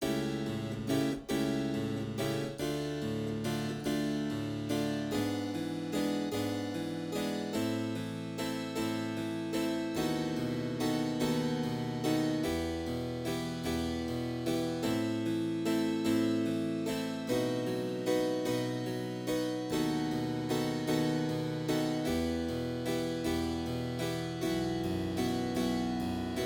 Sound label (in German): Cembalokonzert